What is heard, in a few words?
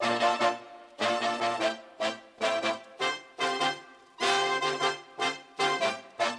Melody